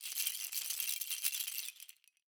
rattles Roll, Raw Perc One Shots; Slate; SD SEISMIC Drum Sample Pack

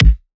kick bam, Drum One Shots; SD MIDNIGHT Sample Pack; Slate

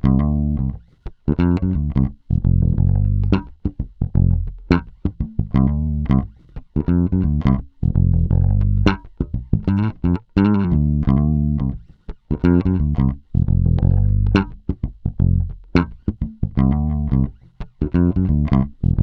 Bass loop 87 D, Electric Bass Loops Direct; Slate Digital BASSHOLE Sample Pack

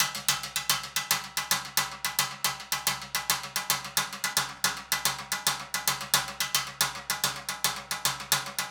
timbales loop 110; perc construction loops; SEISMIC Drum Sample Pack; Slate

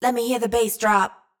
adlib Bass Drop wet, vocals; Slate Digital Omnivox Vocal Sample Pack; Adlibs

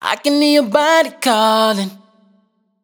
hook Body Calling Am wet, Vocal; Slate Digital Omnivox Vocal Sample Pack

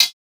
hat lofi, Closed Hat, Drum One Shots; SD MIDNIGHT Sample Pack; Slate

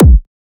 kick clicker; Drum Sample Pack; One Shots; Slate; SEISMIC

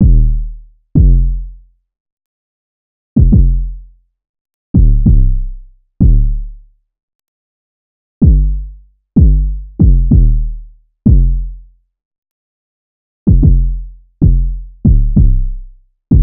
Synth loop 95 Gm, Synth Bass Loops; Slate Digital BASSHOLE Sample Pack

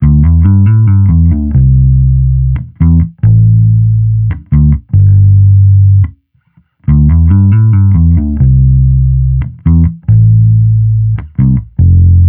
Amped loop 70 C, Electric Bass Loops; Slate Digital BASSHOLE Sample Pack

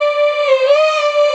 vocal retracer Bb; SD AURORA Sample Pack; Slate

